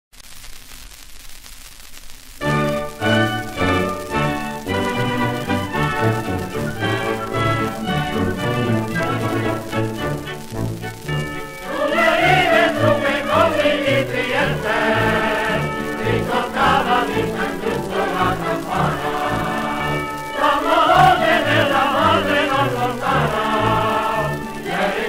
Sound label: Choir; Choir Belmont; Nashville (Tennessee USA); His Masters Voice